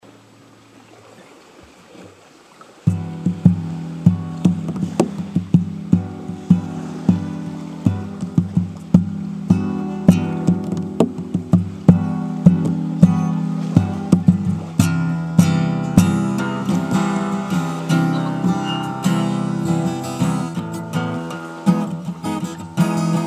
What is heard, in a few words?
guitar; crimea